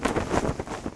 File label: Sail Wind; Civilization4